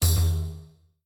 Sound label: button Spin; robin hood